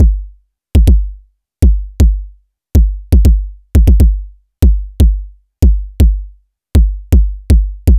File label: Anabeat Kick; Sonic Foundry (Sony Creative Software); Prototechno